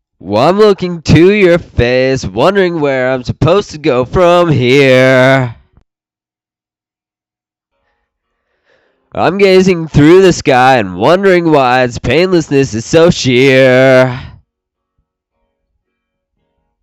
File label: Vocals